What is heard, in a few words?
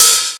good open high hat sound e key